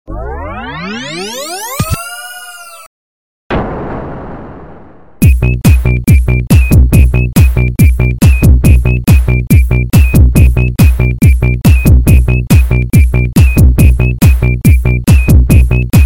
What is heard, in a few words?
disco